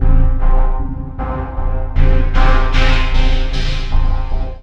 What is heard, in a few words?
SYN FX; X-Static Goldmine 1